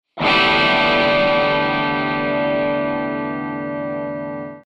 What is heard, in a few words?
chime